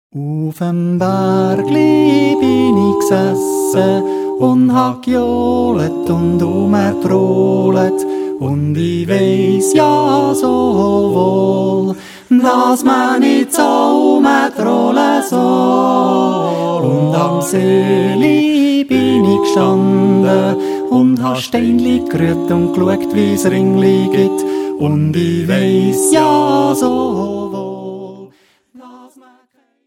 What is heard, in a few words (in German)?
moll